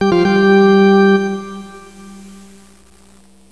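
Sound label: PIPE ORGAN; MUSIC; INSTRMNT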